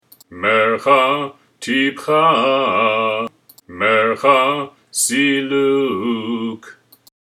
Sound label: Haftarah Silluk, trope; sinai